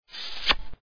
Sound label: kiss